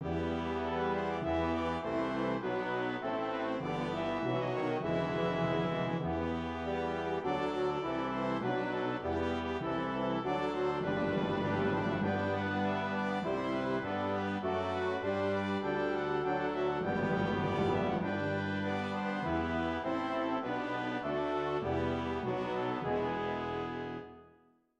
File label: Hymn BRASS; When Christ’s Appearance Was Made Known, or Erhalt uns, Herr